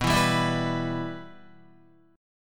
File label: B Suspended 4th Sharp 5th, Guitar; strummed; 4F,4A,5C,5G,5C,6E; Bsus4#5; 6,7,7,9,7,7; JGuitar